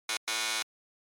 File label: Quiz Buzzer Multi; クイズ